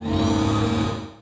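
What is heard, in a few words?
door door2 open; 星际争霸音效-misc-door-door4opn; 星际争霸其他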